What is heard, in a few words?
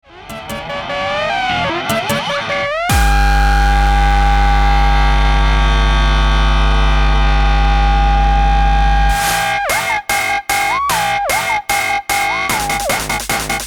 Guitar funky